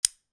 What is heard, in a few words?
click